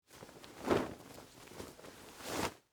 Clothes Short, Foley, Clothes, Short, Sleeve, Shirt, Shake; Dressing; BlastWave